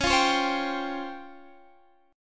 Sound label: C sharp Major Sharp 11th, Guitar DADGAD